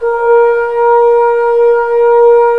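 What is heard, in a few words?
brass trombones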